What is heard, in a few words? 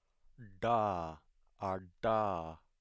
voiced retroflex stop